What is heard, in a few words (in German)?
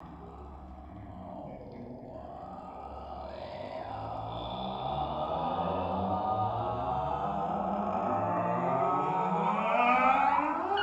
Nackenmuskulaturlockerungsklang; chakraklang; Joachim Sevenich